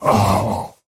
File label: pain big; Slork